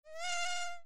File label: ANIMALS